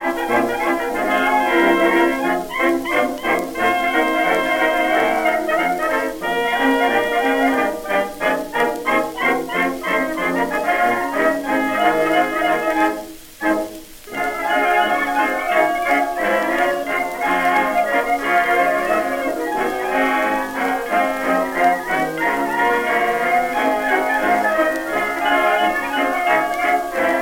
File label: Chinese blues, jukebox blues